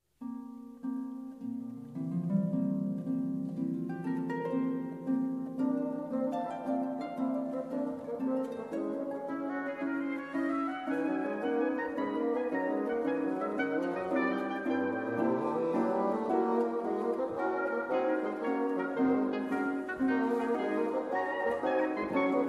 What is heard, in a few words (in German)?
serenade fuer oboe fagott und harfe rondeau